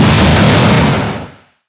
explosion

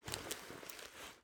generic cloth movement, weapons handling

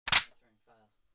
mp5k bolt forward

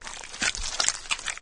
melee, flood infection

